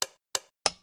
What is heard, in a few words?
ducks on; p00gie